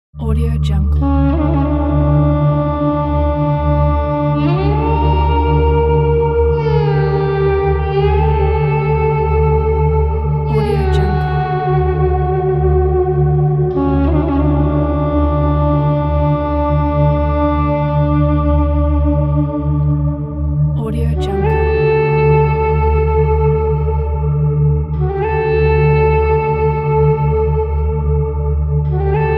duduk

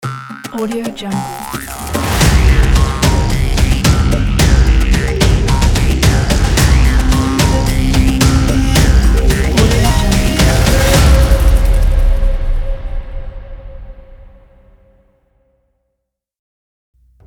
tribal didgeridoo drums logo

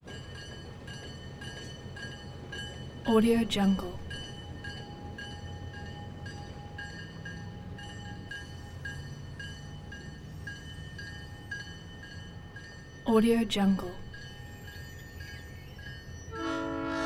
train locomotive

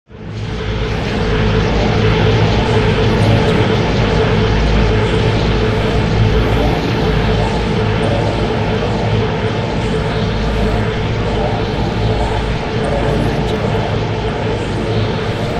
atmo drone m noise; smartsoundfx

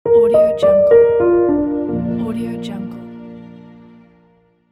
inspiring piano logo

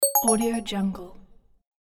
bright message notification

